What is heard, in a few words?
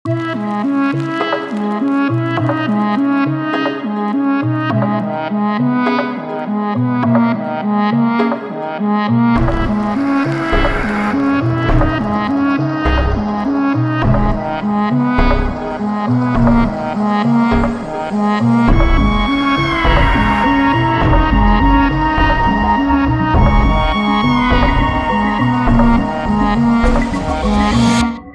Keys; MIUI12